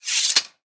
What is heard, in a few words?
Wpn sword chinese unequip